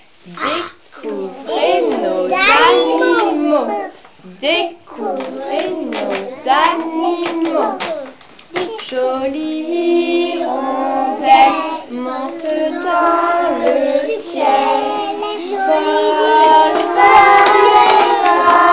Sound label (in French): hirondelle